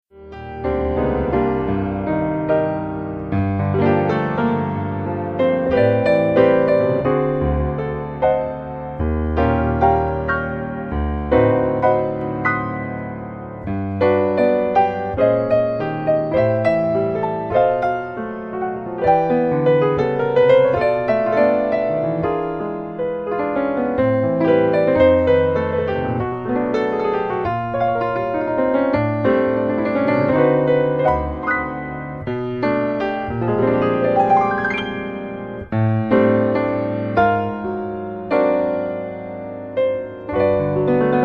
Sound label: solo piano